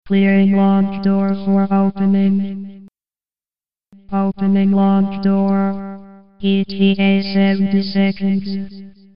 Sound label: door